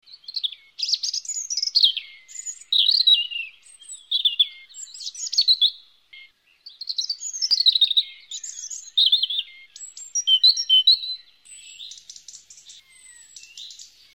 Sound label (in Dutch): roodborst; tuinvogels; infonatuur